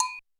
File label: Roland U220 Electric Set, Drum Machines